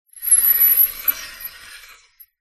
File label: foley metal sharp scrape squeak shrill